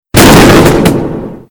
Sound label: fireworks spark; tf